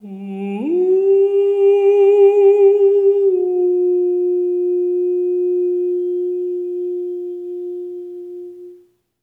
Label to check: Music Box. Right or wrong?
Wrong